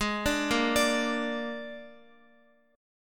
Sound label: Ab Suspended 2nd Flat 5th Ab, Guitar; arpeggiated; guitar chord; x,x,8,7,10,10; JGuitar